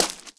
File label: gravel step; Original TK Sounds